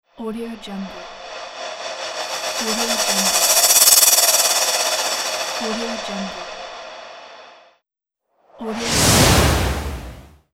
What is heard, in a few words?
whoosh cymbal